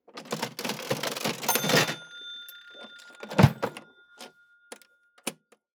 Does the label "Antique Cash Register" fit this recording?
Yes